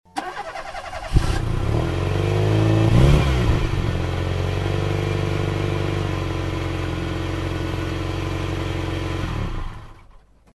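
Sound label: zvuk mashiny, car sound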